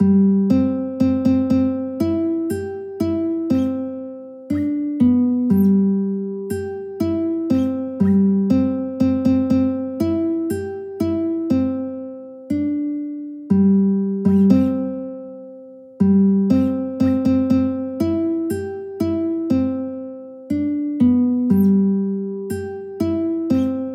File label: the wheels on the bus guitar